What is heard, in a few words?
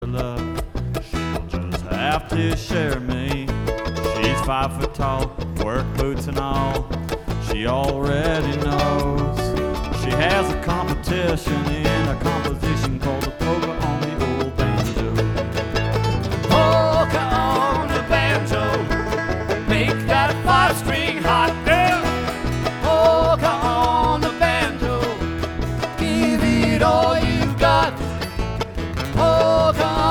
Polka On The Banjo